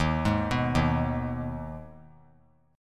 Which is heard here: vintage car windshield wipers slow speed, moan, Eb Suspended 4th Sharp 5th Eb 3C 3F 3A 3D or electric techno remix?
Eb Suspended 4th Sharp 5th Eb 3C 3F 3A 3D